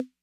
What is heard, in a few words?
Snare Accent Mid Tone Min Snap, 808; classic machines